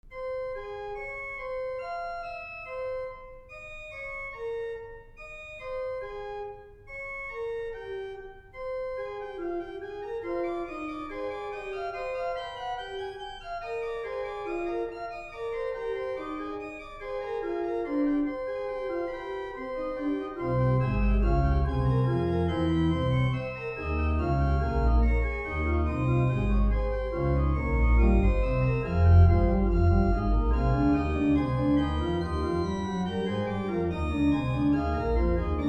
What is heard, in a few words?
organ